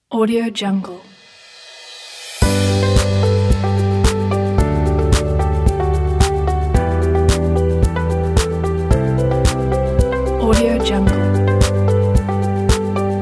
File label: corporate logo